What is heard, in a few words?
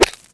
Bullet Stone, Impacts